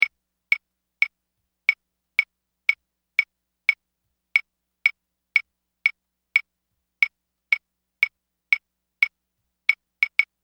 clave

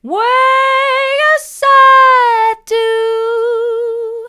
mid, vocal

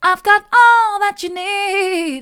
mid, vocal

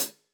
KHats Clsd

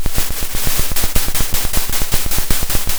pop, Sound of a drum roll

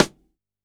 Rim, drum

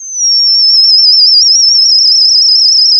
pop, sound of a tea kettle whistling

